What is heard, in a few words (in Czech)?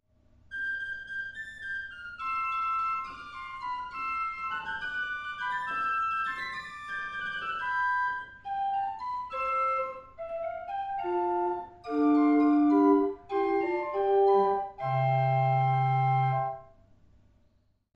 ukazky positiv; hudba